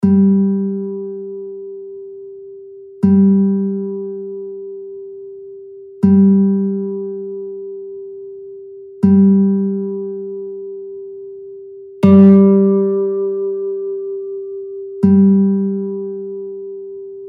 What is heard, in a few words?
Hitara 6 str G strij G3; 3-тя струна – соль, G; Класичний”, або звичайний стрій бандурки